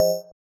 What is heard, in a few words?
BELL; osu